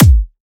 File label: Dubstep Kick; Ghosthack; Dubstep Sound Arsenal